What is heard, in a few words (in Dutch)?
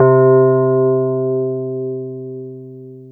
Klokken, FM; Polyend